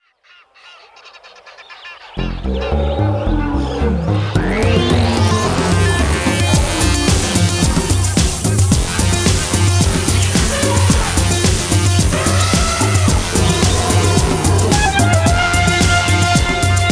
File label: Jungle Beat